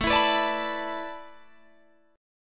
A Suspended 2nd Suspended 4th down Guitar Drop D

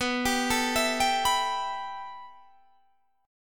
A 7th Suspended 2nd, B

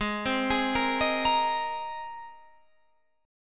Ab Major Add 9th Ab Guitar Standard; Abadd9 arpeggiated; JGuitar

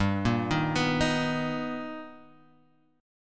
B Augmented Major 7th G, chord

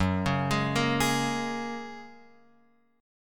Gb Major Add 9th, Guitar Open F; arpeggiated; x,1,1,3,1,3; JGuitar

